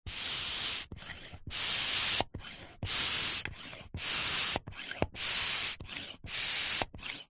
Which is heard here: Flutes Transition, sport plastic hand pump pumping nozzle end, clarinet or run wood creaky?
sport plastic hand pump pumping nozzle end